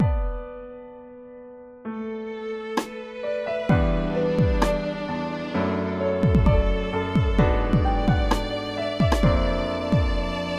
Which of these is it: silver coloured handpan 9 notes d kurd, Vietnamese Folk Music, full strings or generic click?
full strings